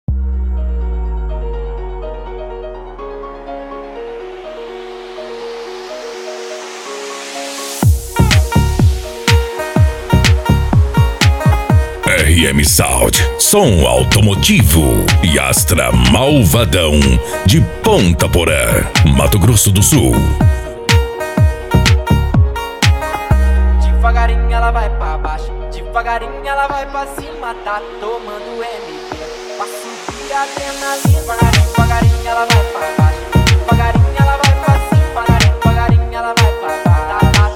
som automotivo

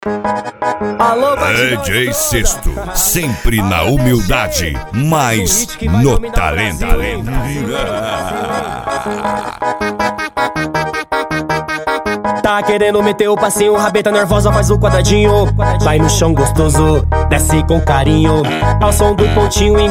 som automotivo

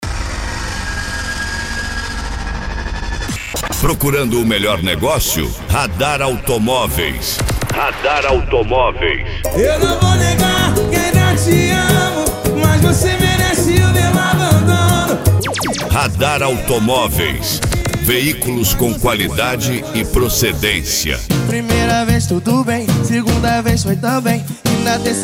pagode e funk; abertura

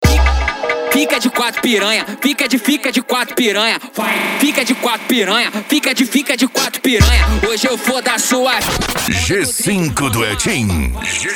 mega funk e funk mega bass

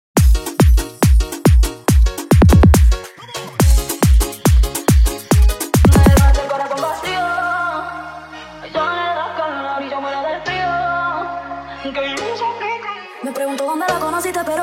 reggaeton

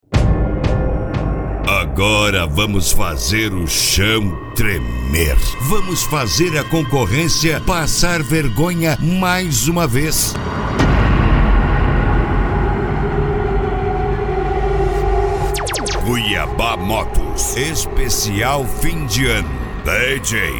deep e funk; cuiaba motos esp fim de ano

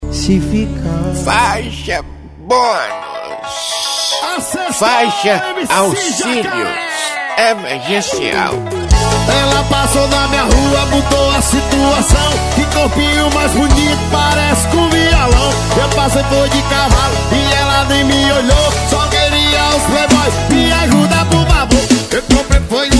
funk e sertanejo

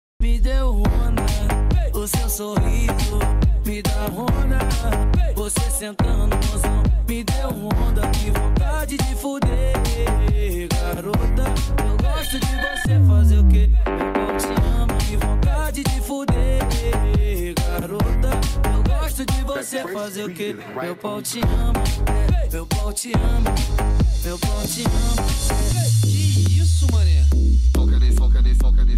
mega funk funk

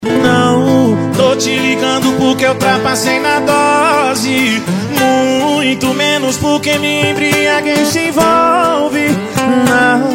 piseiro e sertanejo

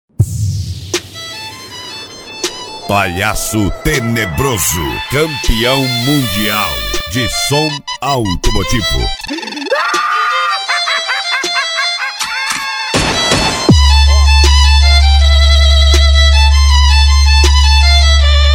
violino; palhaco teneboso

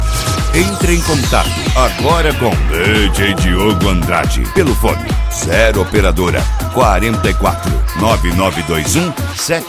eletro funk; executivo

